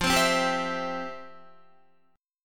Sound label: F Major 7th Suspended 2nd, Guitar; strummed; chordshape; 12,15,12,12,12,12